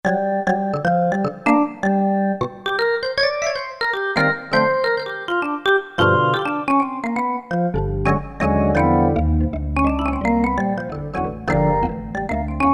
E. Organ, Roland U-220; Factory Patches

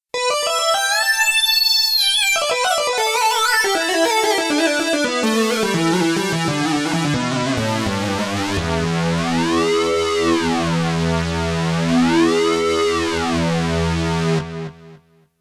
Syn Lead 2, Roland JX-1

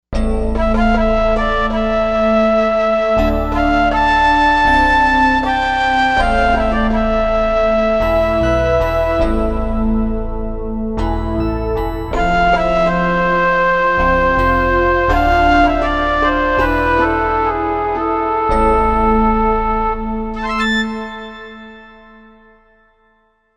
Raga Dance, Korg M1Rex Combinations; Factory Patches

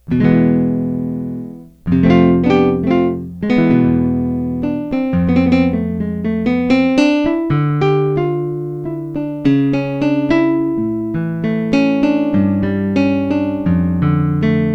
STEEL STRING ACOUSTIC GUITAR

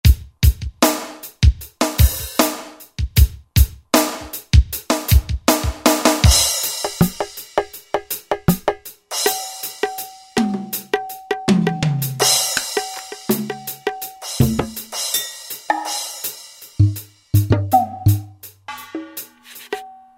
Pop Asia Set; World; Roland SR-JV80-14 World Collection Asia

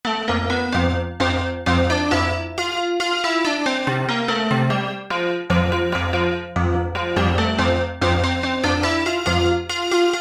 Brake Drums, Korg MSC-2S Synth; Synth 1

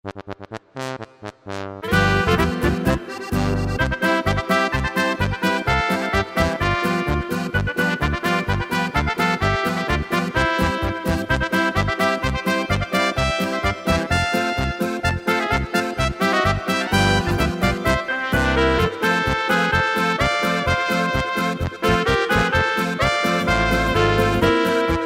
Ober Polka, Yamaha PSR-3000; Factory Demos

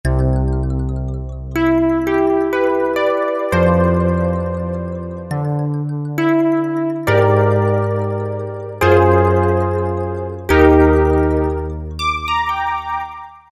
Ice Rain, Roland SC-50 Sound Canvas; Factory sounds

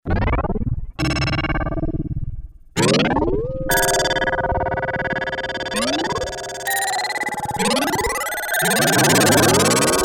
pulse mod. SE, Korg DW-8000; Factory Patches

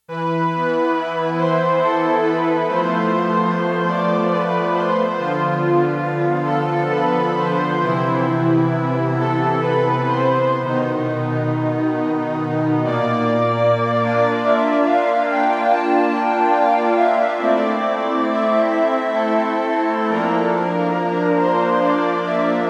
WOODY, Roland JX-1; Factory presets

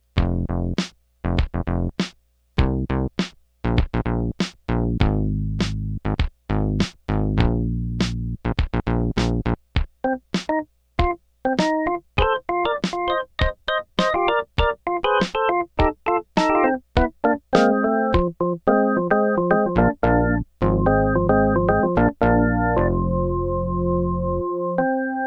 drums bass organ

